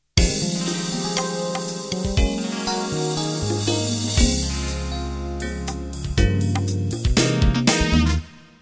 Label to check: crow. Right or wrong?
Wrong